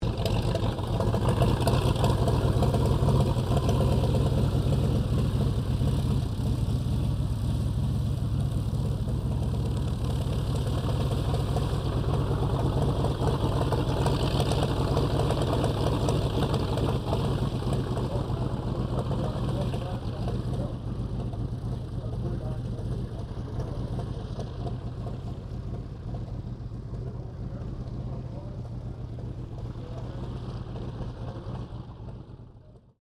AIRCRAFT SOPWITH PUP REPLICA TAXI PA; Replica of a 1916 Sopwith Pup; Airplanes

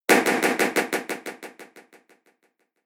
Bang Sound With Delay; Impact / Explosion Sound Effect; Bang Sound Effects

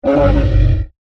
Monster Grunt, Horror, Monster, Grunt, Creature

